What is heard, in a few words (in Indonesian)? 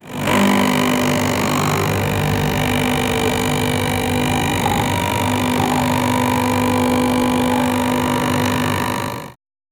suara mesin; coffee machine